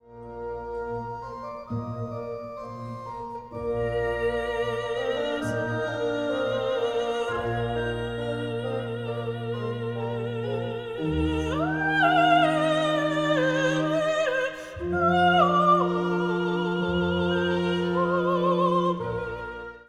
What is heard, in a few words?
Agnus Dei Laughing